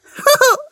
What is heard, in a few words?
Giggle